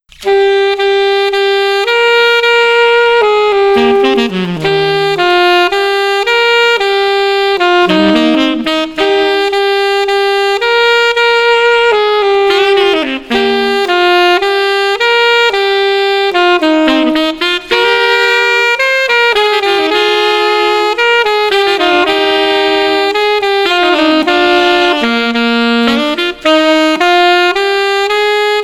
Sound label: Street Jazz 110